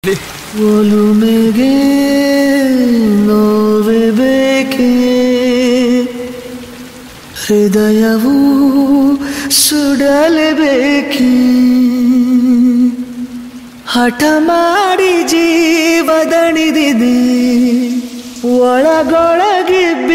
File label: sad song